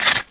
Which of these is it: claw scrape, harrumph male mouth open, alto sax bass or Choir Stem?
claw scrape